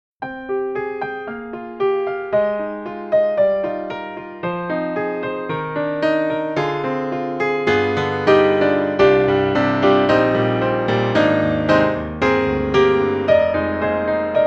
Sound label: Piano, Close Mic; Cinematic Studio Series